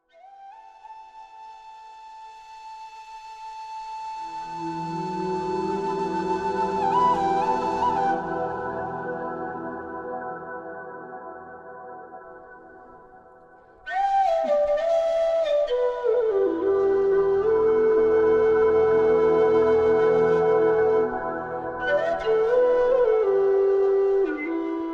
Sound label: low whistle